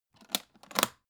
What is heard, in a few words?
alu case small close